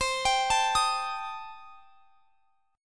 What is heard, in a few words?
C Minor 6th, chord; Cm6 arpeggiated